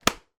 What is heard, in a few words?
foley magazine rolled up swat hit wall